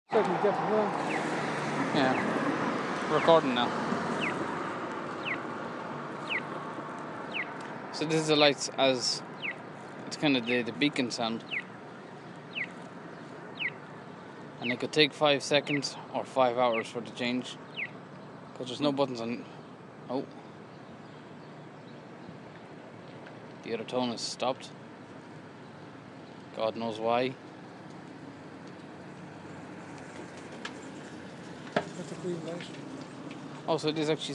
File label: accessible traffic lights